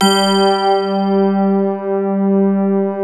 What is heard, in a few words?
PIANO BELL G3; E-MU Formula 4000 Series Vol. 3; Analog Odyssey